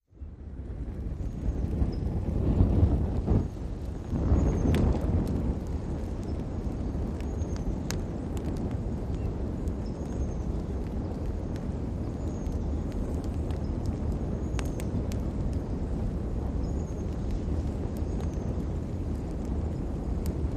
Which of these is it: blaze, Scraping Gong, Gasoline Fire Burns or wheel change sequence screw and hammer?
Gasoline Fire Burns